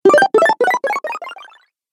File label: Retro Videogame Fx Replenish